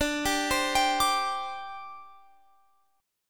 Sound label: D 7th Suspended 4th D Guitar Open F; D7sus4; arpeggiated; x,21,19,19,22,21